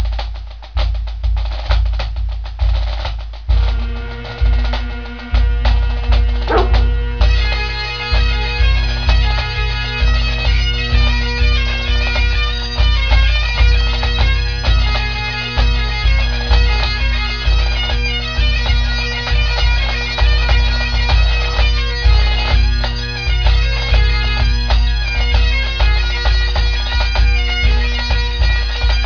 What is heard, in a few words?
jig